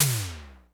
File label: TEK T, TECHNO, Drum Base; 90 sSampleCDs; Crypton - DrumBase5000